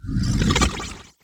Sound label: Teleporter Nebula Cloud Exit; Evolve; Wraith